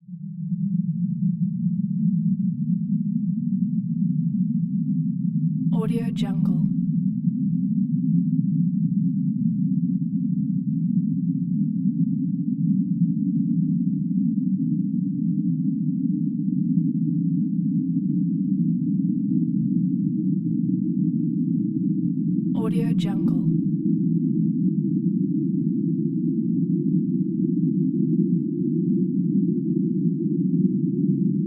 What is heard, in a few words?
atmosphere